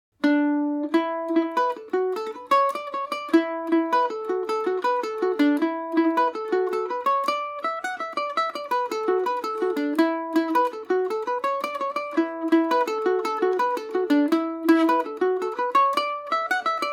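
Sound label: E Dorian, Irish mandolin; The Horse’s Leotard; Paris Swing Macaferri mandolin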